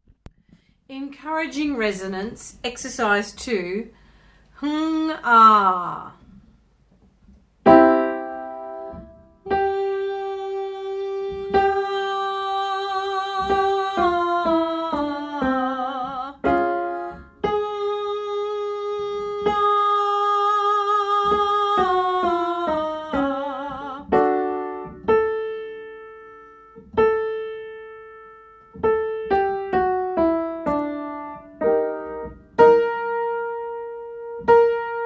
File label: resonance female